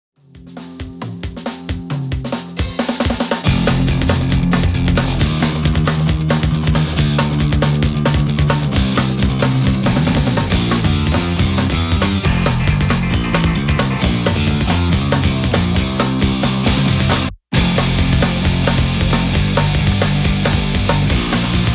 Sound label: Pick Reverend Guitars; Guitars THUNDERGUN MIDNIGHT BLACK